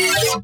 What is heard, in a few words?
weeee